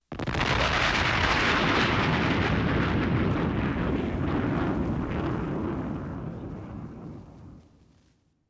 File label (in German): Luftwaffe Durchbrechen der Schallmauer